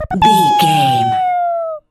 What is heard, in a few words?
voice fun character flying cartoon